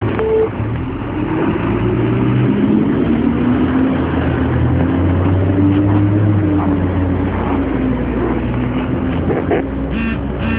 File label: cooling fan alx400, bus; kevsters-sound-recordings